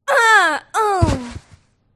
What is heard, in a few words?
mage female death